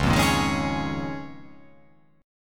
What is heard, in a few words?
C sharp 6th Add 9th C sharp down